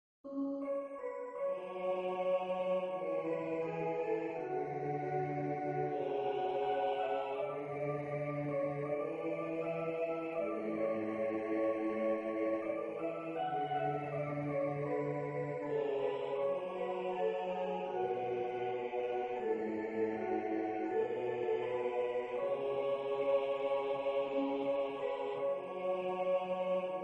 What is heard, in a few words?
bass solo